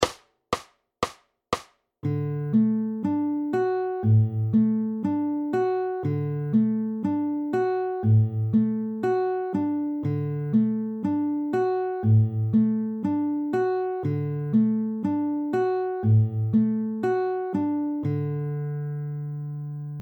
Travis fast, fingerpicking

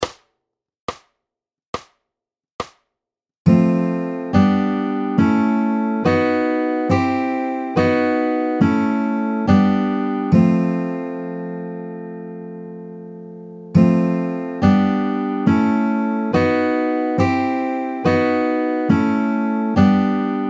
Progression 4 FING, fingerpicking

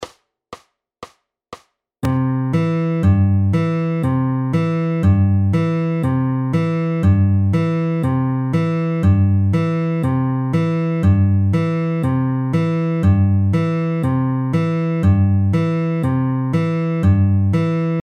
Bass FAST, fingerpicking

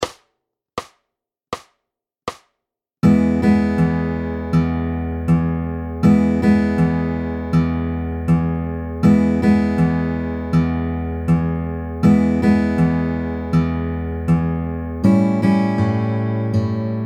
Fingerpick 12 Bar Slow

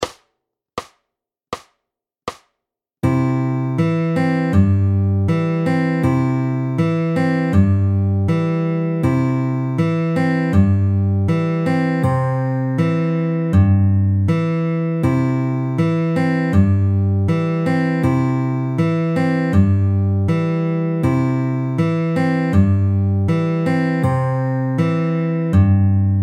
All Pinch, SLOW, fingerpicking

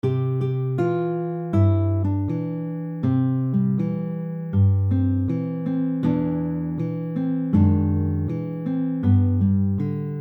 Verse Slow, fingerpicking

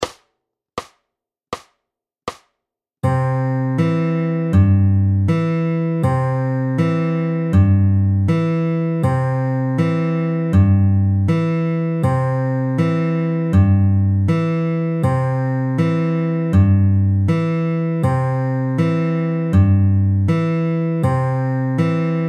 Travis Picking SLOW

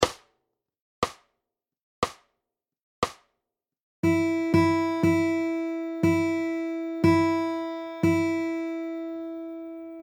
jingle audio

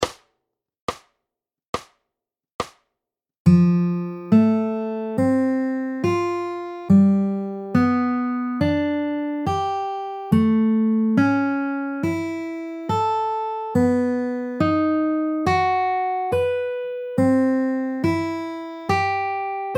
Progression 4 FING ARP, fingerpicking

